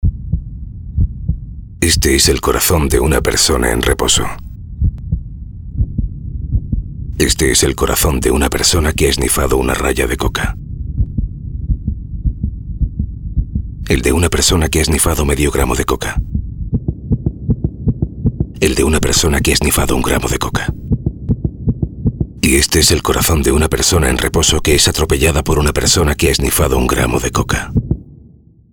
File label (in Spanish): Latidos Castellano; Campañas Fad